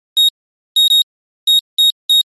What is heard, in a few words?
Digital audio signal; radio signals